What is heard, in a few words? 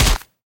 bare fall; Golden Crayon Sounds